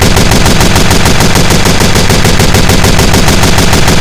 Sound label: shoot, weapons; tomislav; tf